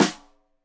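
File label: Snare Stereo, Drums; Deeply Sampled Drums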